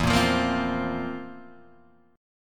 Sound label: F Major 7th Suspended 4th E